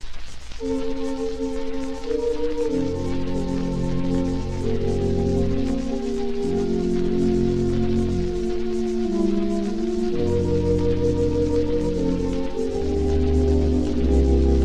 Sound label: organ solo